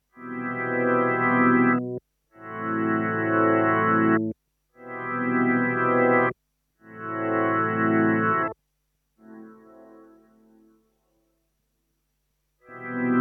Strymon Big Sky Swell Orgel

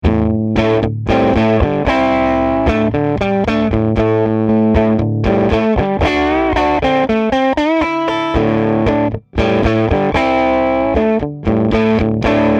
E Gitarre; MA301fet

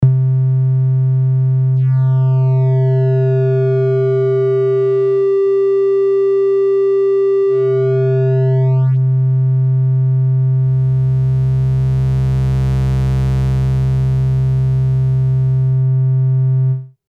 Korg NTS 1 Dreieck

